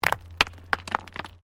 STONES MOVE

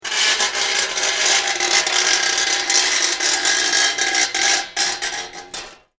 Metal Screech Intense High Pitch; Nasty metal grind screech, ear splitting screeching and clanking

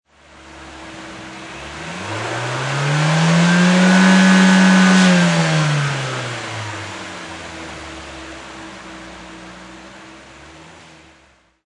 Nissan Silvia Engine Long Rev; Auto, Power Car, High Performance Car Sound; Engine perspective. Motor rev, engine revving; Car Sound Effects

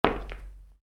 Soccer Boots: Individual Footstep On Concrete; Footstep Sound Effects; Footsteps (Sound Effects:Human); football boot; Stuart Duffield

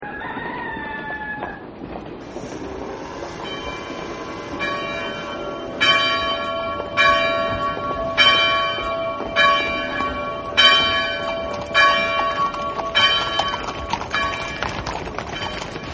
New England, city; Partners In Rhyme

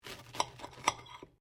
TOAST CUT; Various food preparation; Food (Sound Effects:Household)

